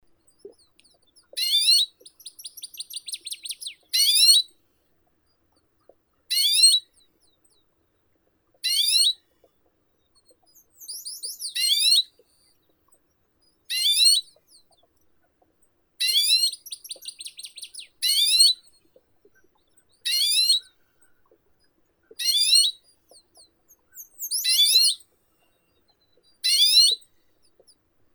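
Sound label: Arctic redpoll, Acanthis hornemanni; chechetka pepelnaya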